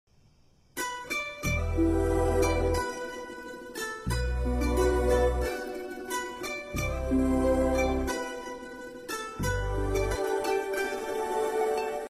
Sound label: Inst, ringtones